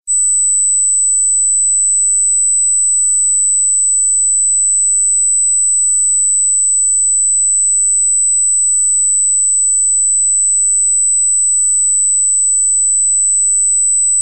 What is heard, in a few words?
Dog Whistle